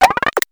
head pickup

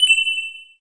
Coin Drop; Pangya; Fantasy Golf

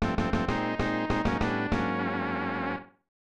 Rough Landing; Pilotwings; SNES

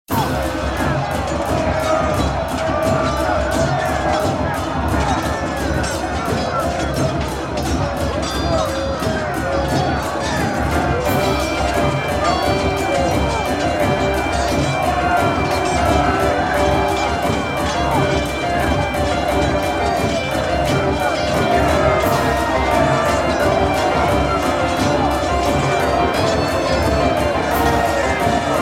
oe battle w sfx; Oriental Empires

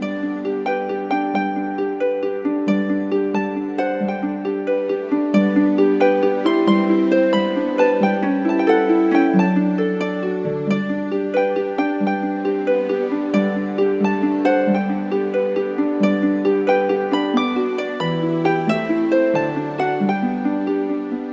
Bridal Chorus; The Sims 4

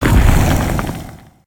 pain

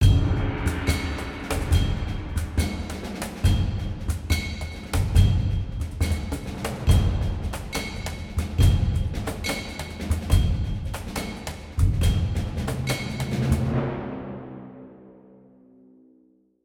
Battle Drums; Necropolis

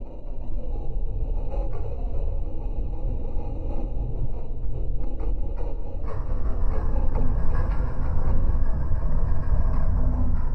Warped Pulses

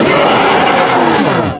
Jump Scare Vocal; The Inn; Zara

